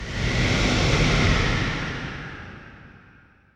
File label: Fight Sequence Earth; Wu Xing; genesis